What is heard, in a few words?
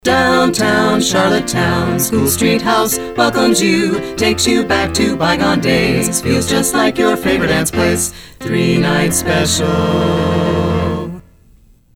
jingle